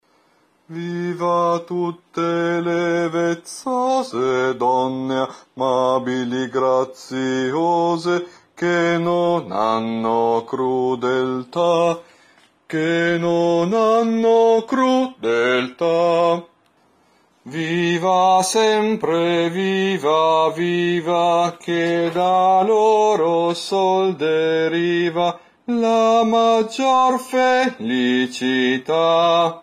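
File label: vezzose tenor bass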